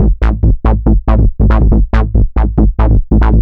bassline, dance

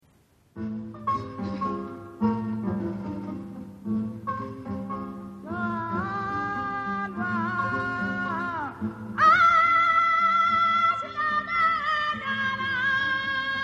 African Tribal Music and Dances-Drums; World Music

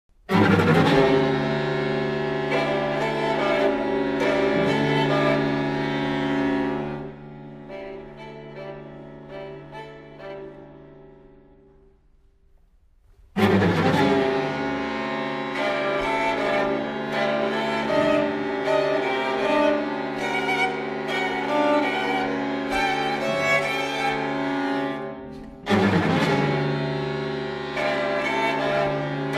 String Quartet No 11, in F Minor, Op. 122; Dmitri Shostakovich; Complete String Quartets

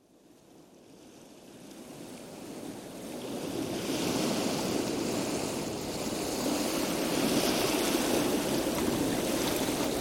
The Sea - Calm, OCEAN; Sounds Of The Earth; Sleep Sounds of Nature

